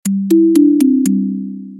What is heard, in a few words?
Jingle Bells Alert